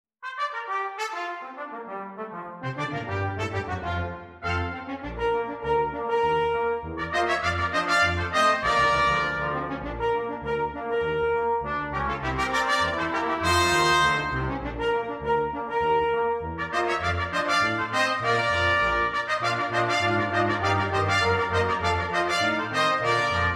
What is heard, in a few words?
Ragtime, брасс-квинтета; Scott Joplin; Trumpet in B 1, Trumpet in B 2, Horn in F, Trombone, Tuba